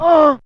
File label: Moan C4, Humans; Humans1